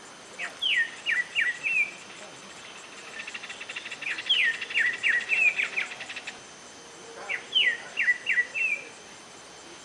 Greyish saltator

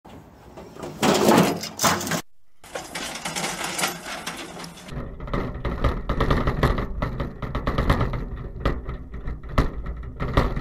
wheelbarrow

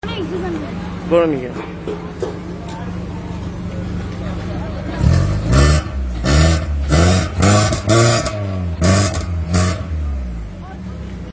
exhaust 220; اگزوز بنزی ۲۲۰

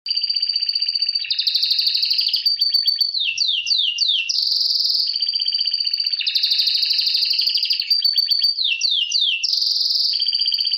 male canary

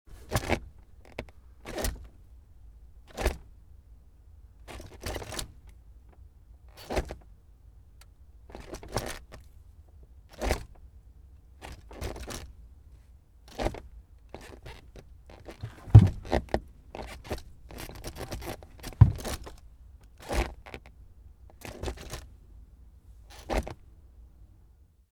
Car Gear Shift

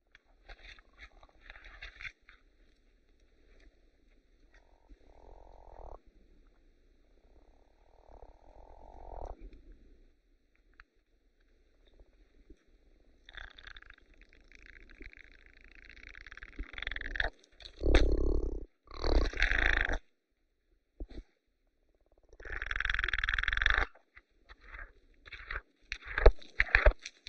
Scimitar oryx; تیز شاخ آفریقایی

